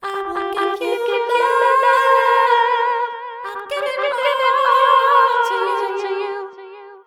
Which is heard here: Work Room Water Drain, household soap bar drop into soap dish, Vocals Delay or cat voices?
Vocals Delay